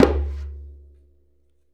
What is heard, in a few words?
Djembe Flam; Essential Percussion